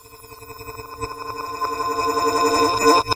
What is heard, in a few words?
fx glass space